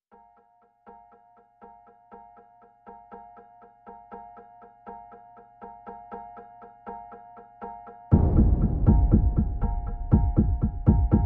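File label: Percussion and Pulses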